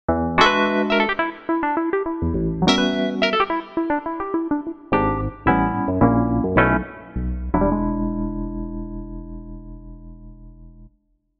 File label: FM 80s Vintage; Yamaha Genos2 Demo